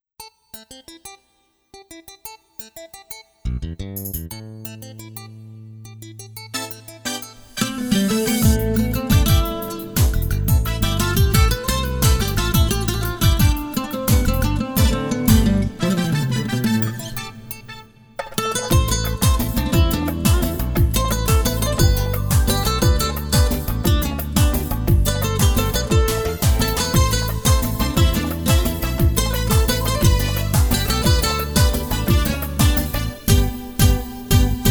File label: Instrumental